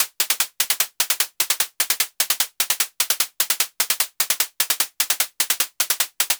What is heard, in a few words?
Anabeat Snare; Sonic Foundry (Sony Creative Software); Prototechno